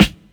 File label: dry acoustic snare f sharp key